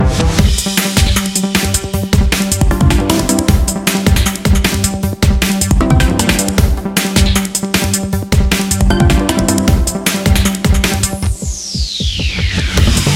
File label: Night theme; Oggstream